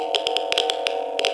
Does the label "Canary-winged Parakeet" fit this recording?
No